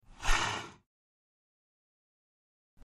Slow Trackball Spins, mouse

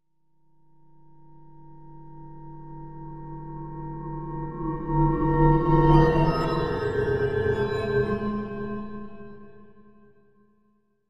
Music Eerie Reverse, pads

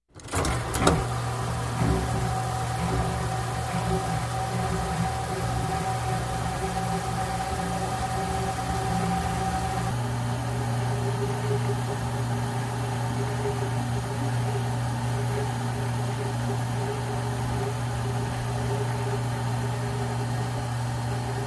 Washing Machine, laundromat

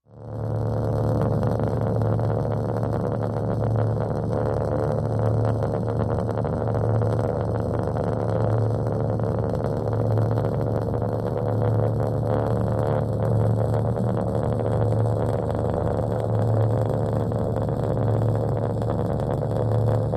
Bottled Bees Machine, electrical

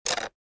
Button Pencil Droppi

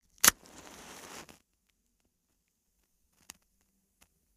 match strike

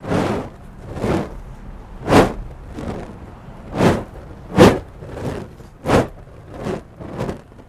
Flame Bursts, torches

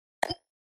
Button Thick Clap Ele

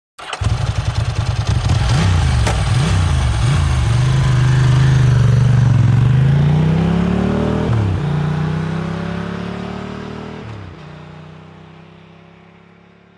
Motorcycle Road Racing

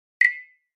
Flash Beep HF Spring

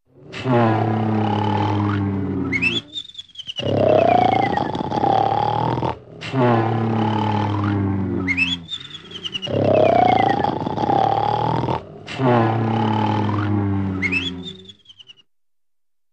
3 Men Snoring 1 Snort

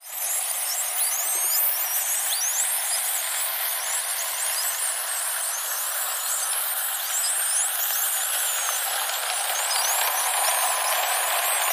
Dolphin Spotted Whis

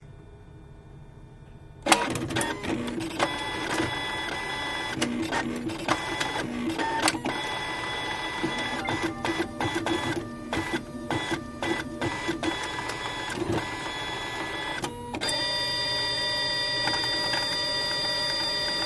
Machine Printer Hum, printers

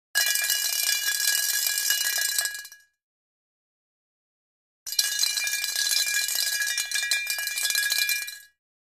Rattle In Can; Tiny Object Being Rattled In A Can; rattles

